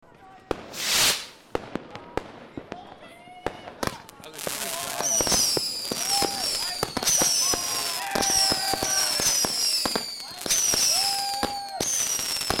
silvester fireworks close to two neighbor parties on street